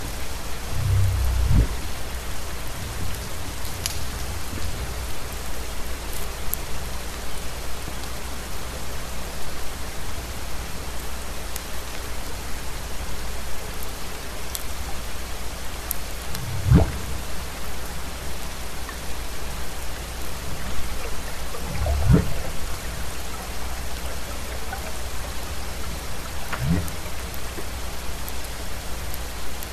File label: Humpback whale whup